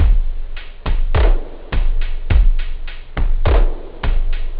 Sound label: old house, loop techno